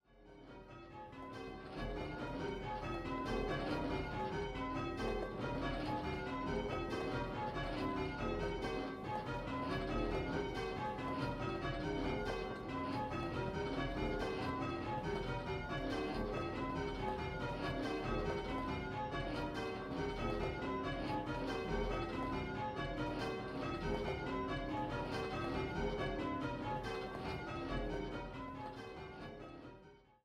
Triples, bells; Pebworth

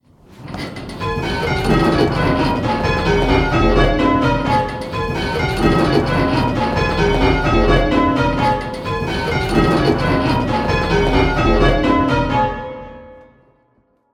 Dorian, bells

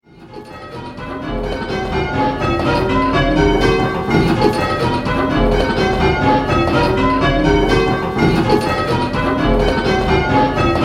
8-Bell, bells; What place is the treble in?

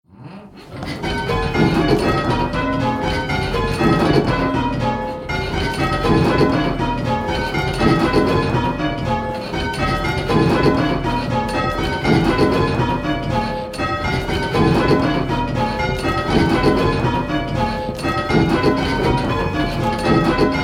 Dorian Stedman Triples, bells

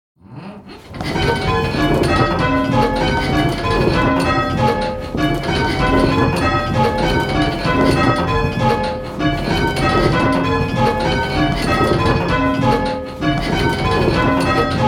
Penta St Simons, bells, St Simon's Doubles

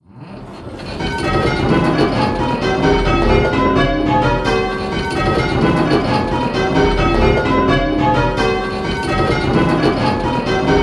Striking 8-Bell Call Changes, bells

